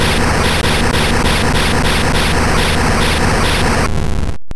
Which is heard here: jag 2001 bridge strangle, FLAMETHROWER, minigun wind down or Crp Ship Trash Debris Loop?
FLAMETHROWER